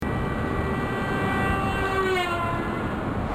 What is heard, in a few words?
doppler